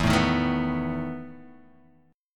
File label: E Major 7th Suspended 4th E, Guitar, Open G